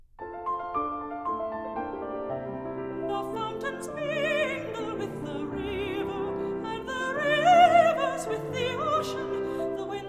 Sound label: Songs, for voice & piano; Love's Philosophy